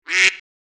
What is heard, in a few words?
Duck quack single call; bird